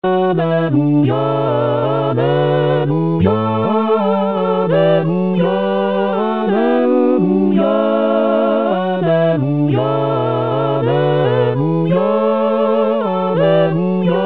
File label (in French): Alleluia, Chorale; St Victorien; Paques